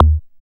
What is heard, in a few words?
BD ANALOG; Sound & Vision - Gigapack II CD 2